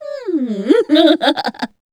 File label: succubus laugh long; queen girl